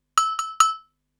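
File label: PERCUSSION ELECTRO 140, Techno Drum; Techno2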